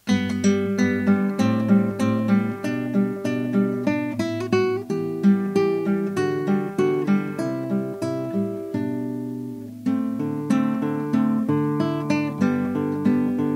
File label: Carulli Andantino G major